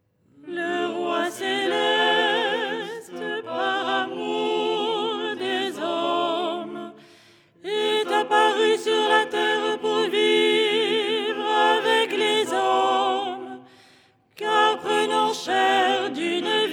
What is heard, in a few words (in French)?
soprano